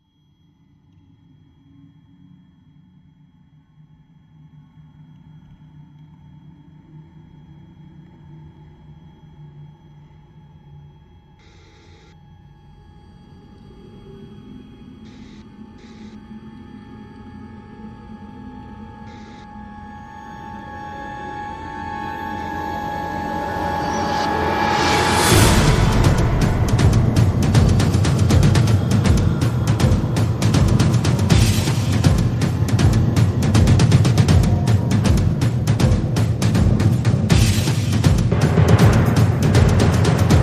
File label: Perc and Pads